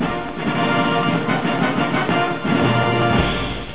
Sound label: fanfare